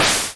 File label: boom